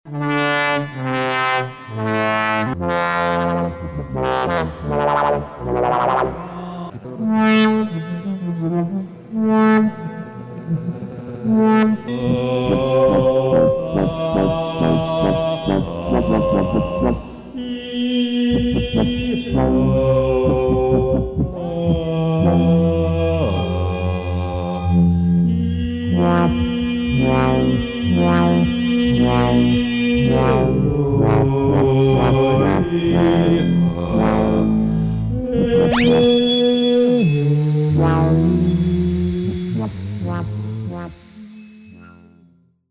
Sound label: Tuba